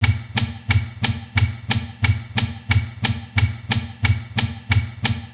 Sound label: synth tick tock